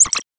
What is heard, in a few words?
Sci Fi Two Part Click